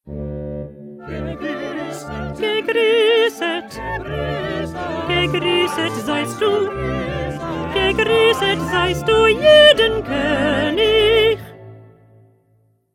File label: 2nd Alto